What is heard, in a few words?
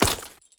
armor down